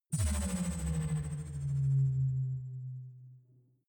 energy shield shut down; energie

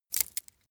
small metal crack soft; industrie wirtschaft; metall

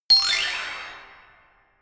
notification glockenspiel horror slide up hard

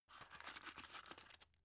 drug pull out shaky; berufe; arzt

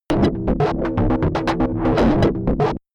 calculator low, roboter; comedy-cartoon; soundpool

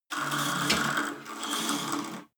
cable machine old pull release fast; sport freizeit

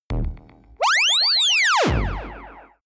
signal booster; sweeps swells; comedy-cartoon; soundpool

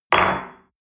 espresso place on table hard

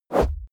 swoosh close; swooshes-swishes

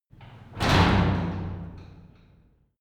garage door close short hard

